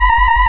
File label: spaceship intercom tone